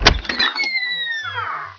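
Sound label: OPEN DOOR; CHASM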